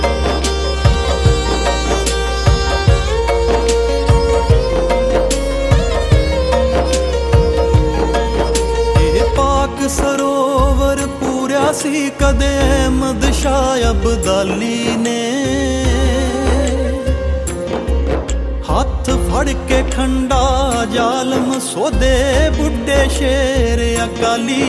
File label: punjabi ringtone